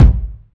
smooth bottom end bass drum single hit g sharp key